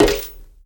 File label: On Stone Walk